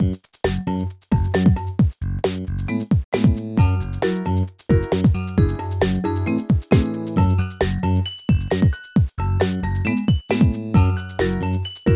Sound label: Music Loop; Digging for Dinosaurs; NDS